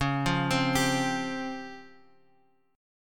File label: Db Major Sharp 11th Db Bass Standard; 21,20,22,24; arpeggiated; chord shape; JGuitar